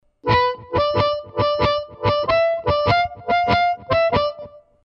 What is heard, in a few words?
Lift Chrom, harmonica